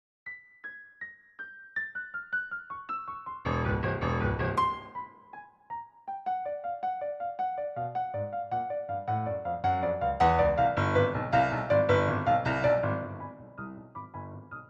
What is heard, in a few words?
Piano Sonata 2 Mvt3 fragment